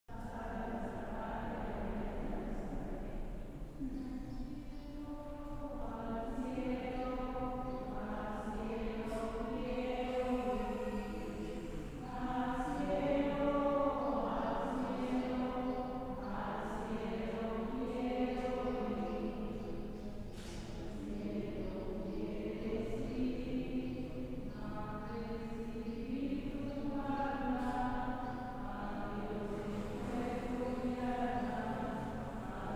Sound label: church singing